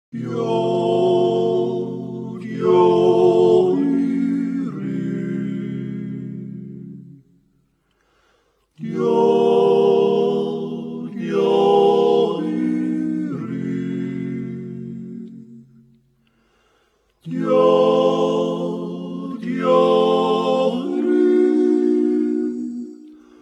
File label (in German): andachtsjodler